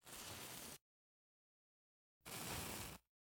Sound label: Household Match